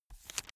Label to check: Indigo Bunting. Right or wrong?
Wrong